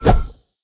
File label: swing; Smite; Tiamat